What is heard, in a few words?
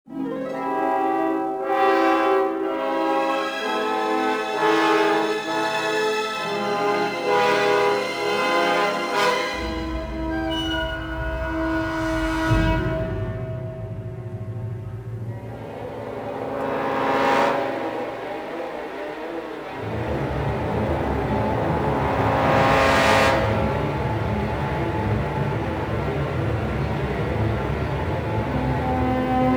action; Star Wars Jedi Knight II Jedi Outcast; XBOX; yavin trial